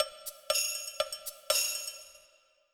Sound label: techno